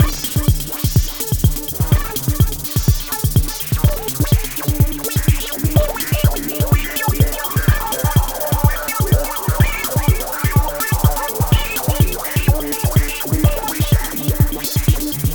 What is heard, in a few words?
Synthetix, Processed Percussion